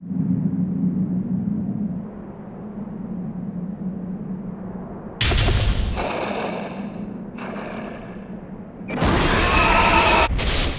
Appear

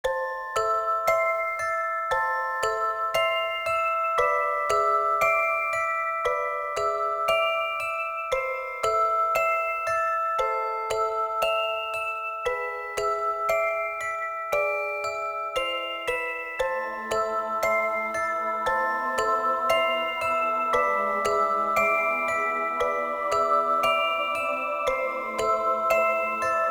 Horror Lunapark

